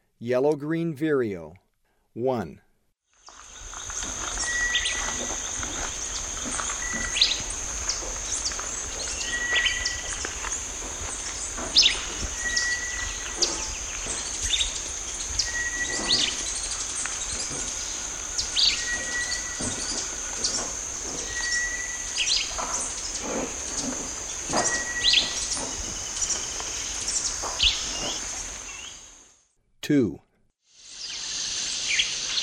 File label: Yellow-green Vireo; S. America; Eastern Lowlands